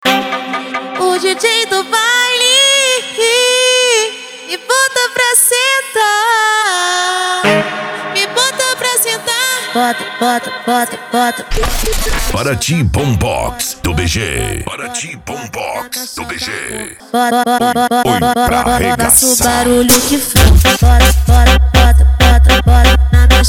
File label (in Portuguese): bombox funk